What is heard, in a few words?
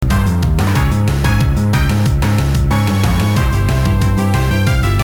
Game Tune